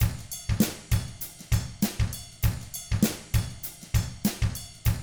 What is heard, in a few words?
FUNKY, Drums